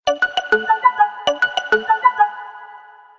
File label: Tik Tulilu Sms